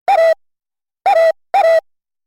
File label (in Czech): melodie